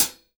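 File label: Hi-Hat, Drums Acoustic; USB Soundscan vol.10; AKAI; 90s sample CD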